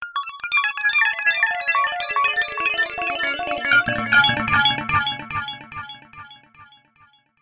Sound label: arpeggios glass arpeggio